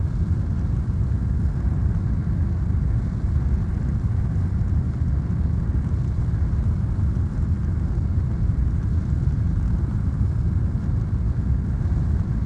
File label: chimney Stack; Star Wars Obi Wan; XBOX; ambience